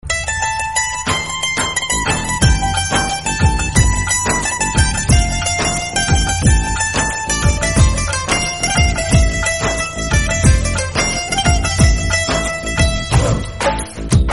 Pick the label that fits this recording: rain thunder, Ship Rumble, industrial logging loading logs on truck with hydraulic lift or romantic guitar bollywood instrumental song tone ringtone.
romantic guitar bollywood instrumental song tone ringtone